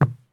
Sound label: landing wood; Escape from Tarkov